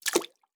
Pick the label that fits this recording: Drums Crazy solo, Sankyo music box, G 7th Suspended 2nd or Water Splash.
Water Splash